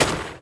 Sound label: cold impact, spells